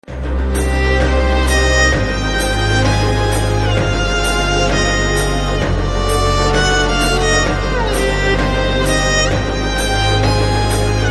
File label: Sad BGM Violin Ringtone; Thambi